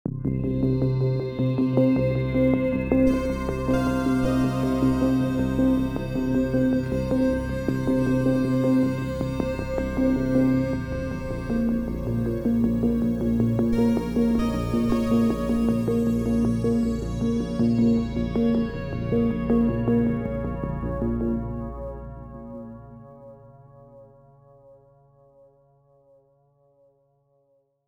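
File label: Day Ambience Stinger; dying light gamerip 2015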